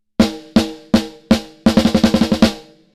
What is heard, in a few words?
snare TONAL mid high boost; SplitEQ